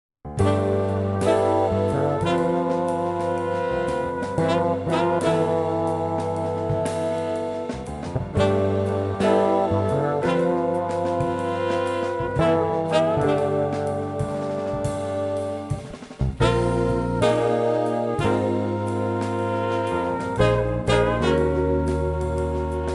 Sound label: Slow Jazz; Kid Pix 3 Deluxe; Windows